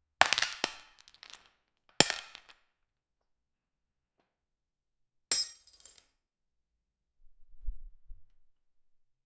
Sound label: the falling of the casing